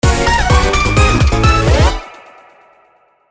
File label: Snd Speedup; Mindwave